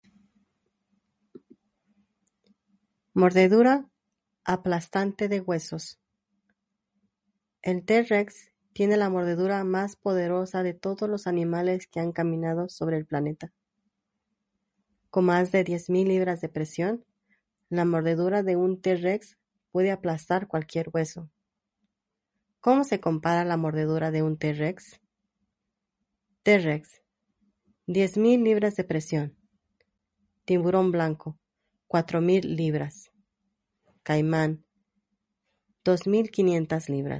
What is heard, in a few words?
Bone Crushing Bite